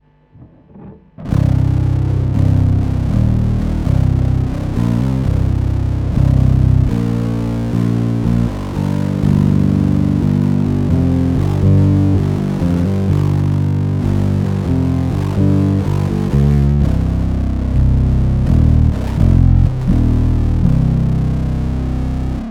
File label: muff BAT46; diy